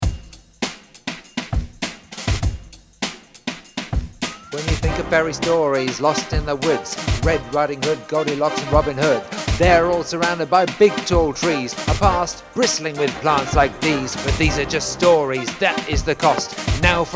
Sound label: Rap